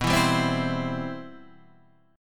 C Major B

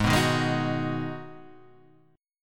Ab Minor 7th, chord; strummed; JGuitar

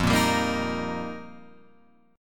F 7th Suspended 2nd Suspended 4th F Guitar Open F; F7sus2sus4; strummed; JGuitar

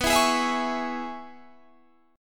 C sharp Minor B, guitar chord; C#m/B; strummed; JGuitar

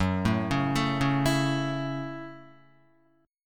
Gb Minor 7th, Guitar; arpeggiated; JGuitar

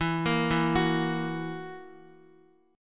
E Suspended 2nd Flat 5th E down Guitar; Esus2b5 arpeggiated

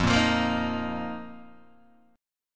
F 7th Suspended 4th F, Guitar, DADGAD

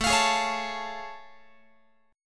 A Augmented Major 7th A Guitar; A+M7 strummed

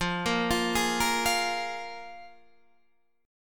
F Minor Double Flat 5th, Guitar, DADGAD

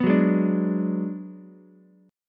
B Minor 6th B, Ukulele; Bm6 strummed; JGuitar

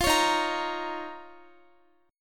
Eb 7th Suspended 2nd, Guitar; strummed; x,x,17,18,15,18

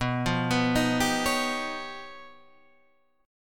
C sharp 9th Flat 5th B, chord

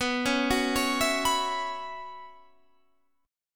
B Suspended 2nd Suspended 4th, Guitar, Standard; Bsus2sus4 arpeggiated; JGuitar

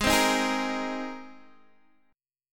Ab Major Add 9th Ab down Guitar DADGAD; Abadd9 strummed; JGuitar

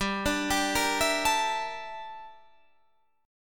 G Minor 6th Add 9th G down Guitar Standard; Gm6add9; arpeggiated; JGuitar

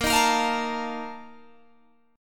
A sharp Major 7th Suspended 4th, Guitar Open G

